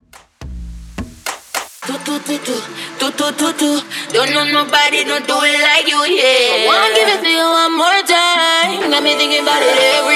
Latino Re Drum In Outro 106 BPM

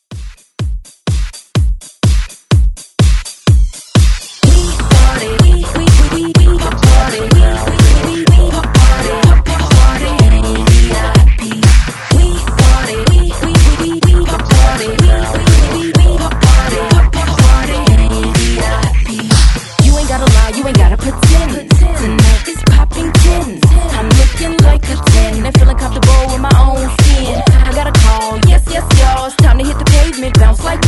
Hype Re Drum 125Bpm; DJDOLLS

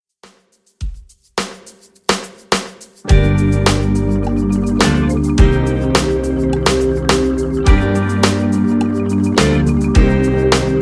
Intro Outro Clean 105 Bpm

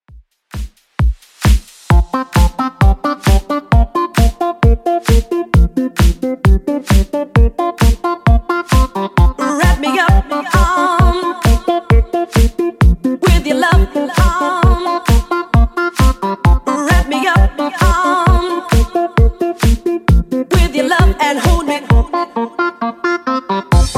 90s Redrum 132bpm CLEAN

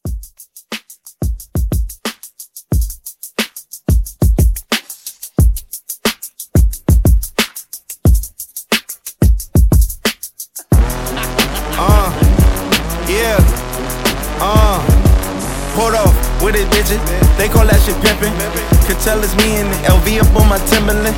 Hiphop Mixshow Edit 90

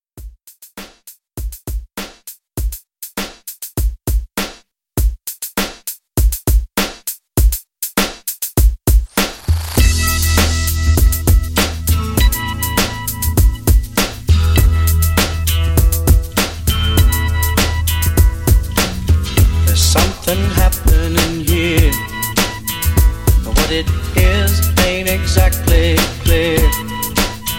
60s Folk Rock Re-Drum 100

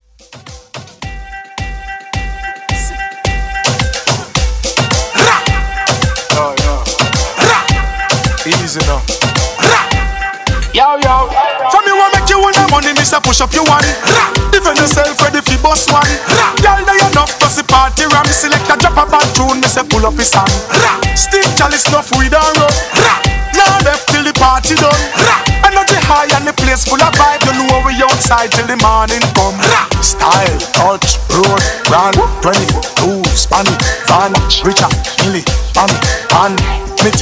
Dance hall Hook Intro Outro 108 BPM

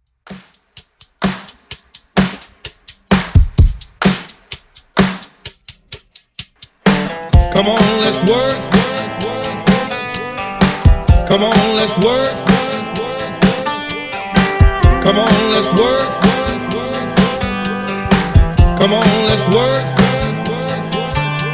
Re drum

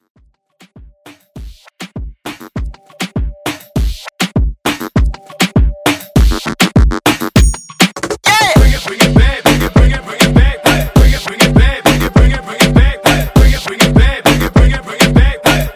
Moombah Hype

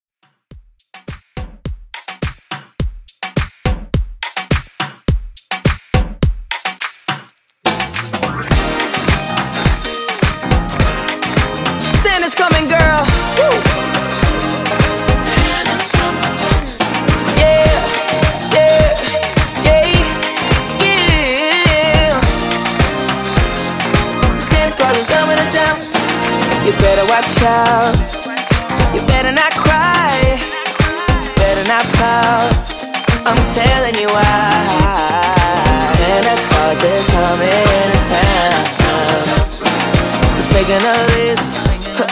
Extended ReDrum 105Bpm

